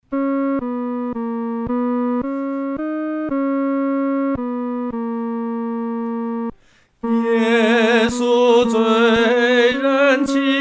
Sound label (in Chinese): 独唱 第三声; 伟大的救主